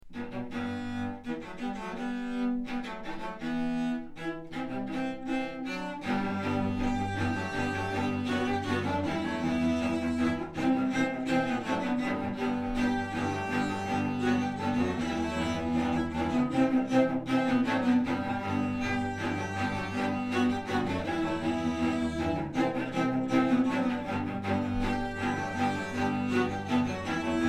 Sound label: Cello Ensemble; The Office